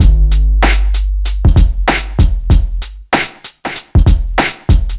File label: 96 bpm drum groove g key, Free drum beat - kick tuned to the G note. Loudest frequency: 993Hz